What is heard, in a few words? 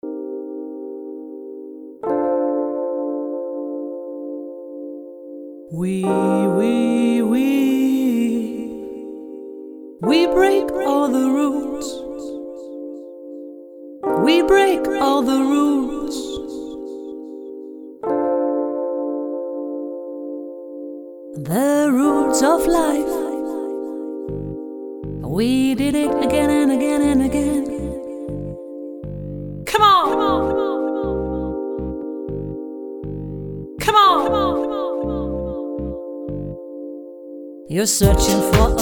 Female Vocals